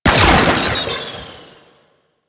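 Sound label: Proto Infested Mutalist Projectile Fire; Warframe; Abilities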